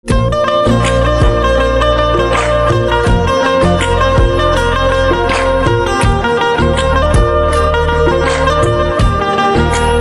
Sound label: Banjo Instrumental Ringtone